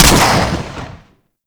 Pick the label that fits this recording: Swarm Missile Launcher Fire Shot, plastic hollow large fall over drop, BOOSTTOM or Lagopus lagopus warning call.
Swarm Missile Launcher Fire Shot